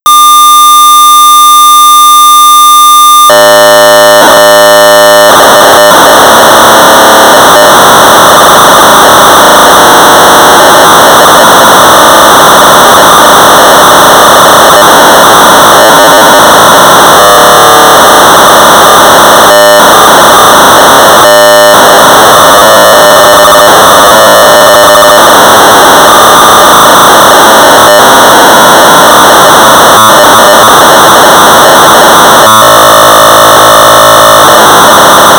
Sorcerer 1200 baud; Martian Invaders; James Albanese; Quality Software

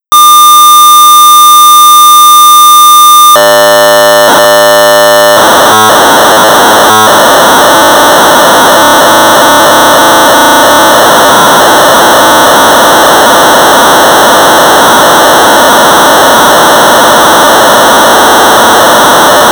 Sorcerer 1200baud; Cybernetic Pool; P.J.B. Systems; BASIC

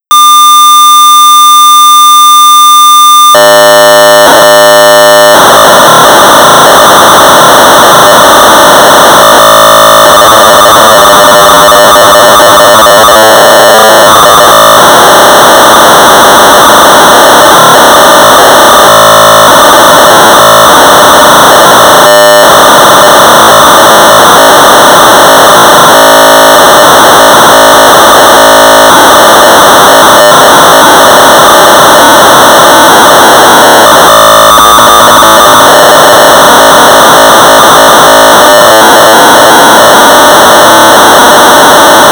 Sorcerer 1200 baud; Sorcerer Invaders; load from Monitor but BASIC ROM Needed

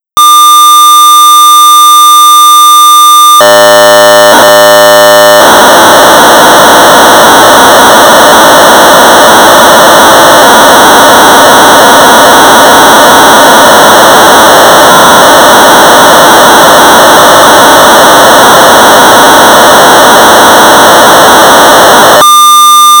Sorcerer 1200baud; Exponential Curve Demo; BASIC

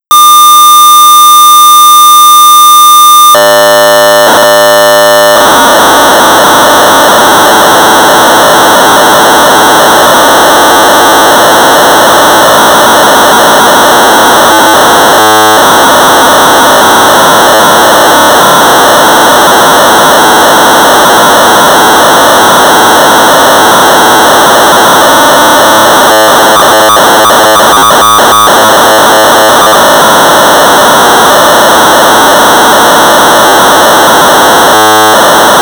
Sorcerer 1200 baud; Horse Race; Gerard Neil; BASIC; clipped

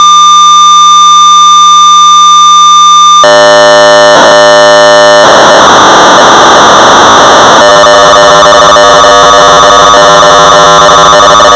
Sorcerer 1200baud; Galaxy-Invasion; Big Five Software; Port by L. Ayling

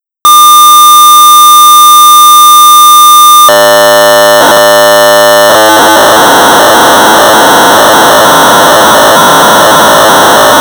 BASIC Sorcerer; Labyrinth Maze Generator; Paul Grimshaw; Computing Today

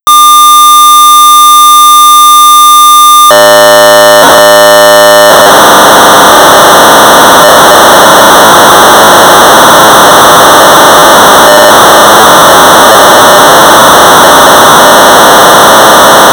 Sorcerer 1200baud; Fastgammon-E1 version; Quality Software

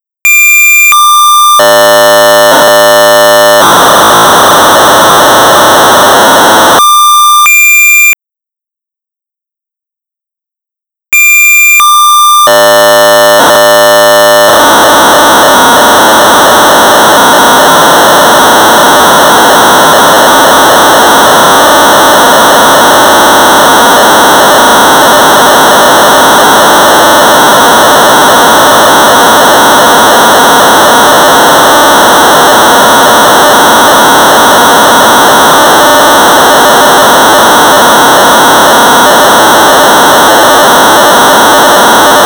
Sorcerer 1200 baud; magic maze; V.Tolomei; Quality Software; BASIC; 8k

